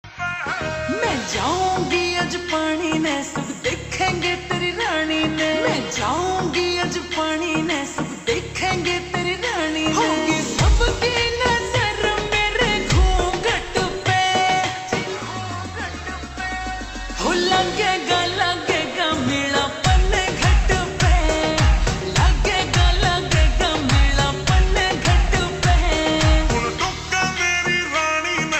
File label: Ringtone Slowed Reverb